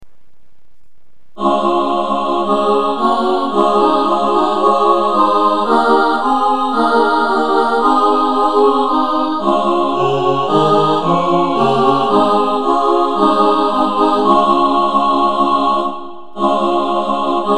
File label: women tenor, choir